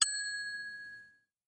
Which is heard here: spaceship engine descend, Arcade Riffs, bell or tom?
bell